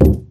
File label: metal grenade impact soft; physics